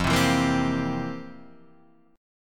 F Major 7th Suspended 2nd Suspended 4th Guitar Half Step Down